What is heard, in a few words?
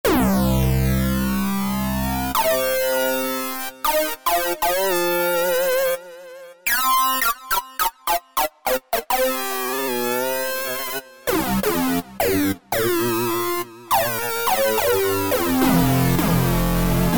SYNTH LEADS; Synchro Nice; Apple GarageBand 2